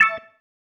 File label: Stab, Organ, One Shots